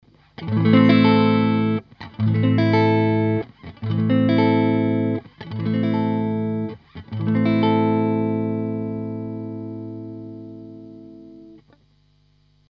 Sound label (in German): EQ High Boost Strat Hals PU